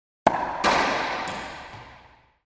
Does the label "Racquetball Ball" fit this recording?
Yes